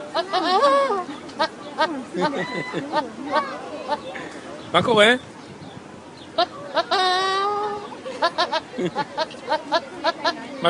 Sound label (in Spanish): cabra gallina